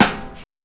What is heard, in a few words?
old school damped steel snare drum sound g sharp key